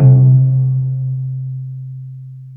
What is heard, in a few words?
tim bells C2; TIMBELLES